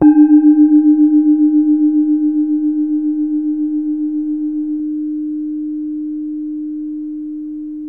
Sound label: calung, gamelan; gravityharps